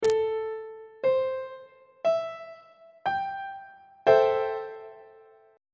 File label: b sharp major 6th 3rd inversion; on treble clef